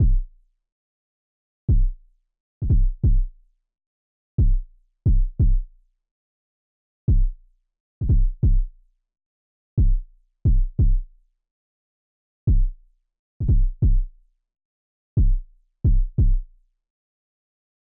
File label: kick